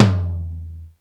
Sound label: Tom High